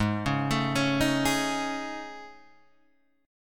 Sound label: A Major 7th Flat 5th